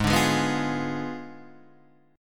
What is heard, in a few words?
Ab Diminished 7th Ab Guitar Half Step Down